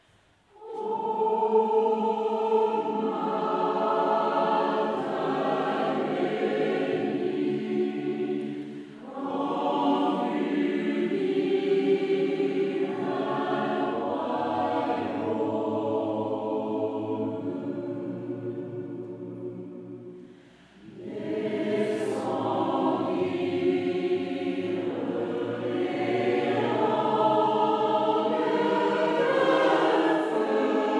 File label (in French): PSAUME DE PENTECOTE